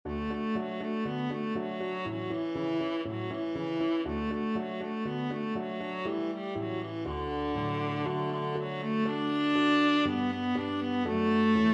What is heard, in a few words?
viola